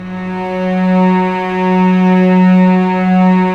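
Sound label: cellos, mezzo forte, ambience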